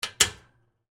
Gate Handle Lock; Foley; Gates (Sound Effects:Doors)

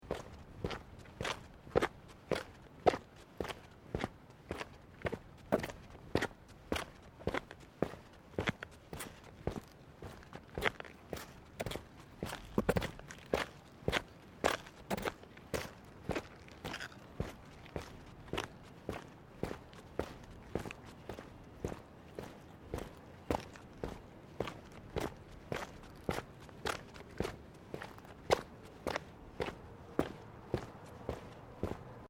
Footsteps Boots Ceramic Paving Tiles Sequence; Footstep Foley; Footsteps (Sound Effects:Human)

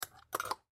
Hanger Hang Metal Shirt Clothes; Closet; Household

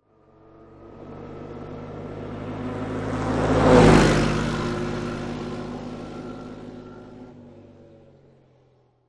Quad Bike Drive By Center; Motorbike, motorcycle type Quadbike. Drive by, Center; Motorcycle Sound Effects; Lynne Music

